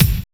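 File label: big tambo kick; Drums In Your FACE!; NEWJACK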